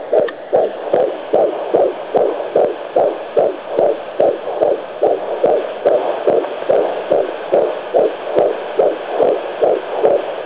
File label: beat